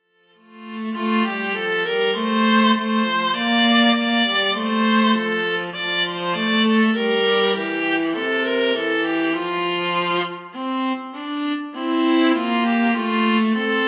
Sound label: Musette